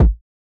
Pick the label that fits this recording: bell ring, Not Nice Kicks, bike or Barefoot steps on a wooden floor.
Not Nice Kicks